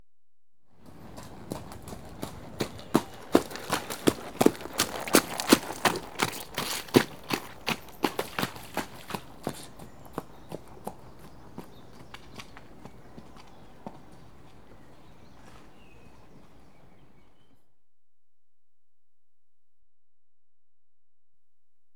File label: One Unshod Horse Trots Through Pu; Horses & Dogs; BBC Sound Effects Library